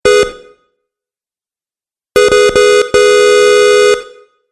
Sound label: Car Horn Two Sounds; Car Sound Effects; vehicle